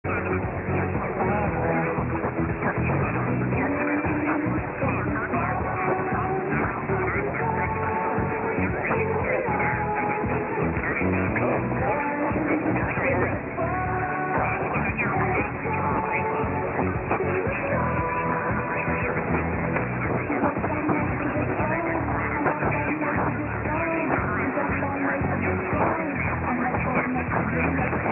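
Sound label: RNE Sevilla Spain, radio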